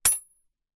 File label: household knives large hit together